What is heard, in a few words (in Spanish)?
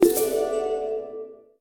sfx estrella; Bunnicula - The Cursed Diamond